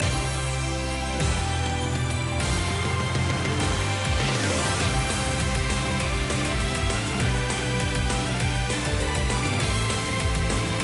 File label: offvocal loop